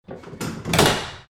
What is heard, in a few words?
door close